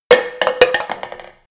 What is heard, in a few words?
plastic fall